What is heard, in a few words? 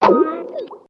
skype call failed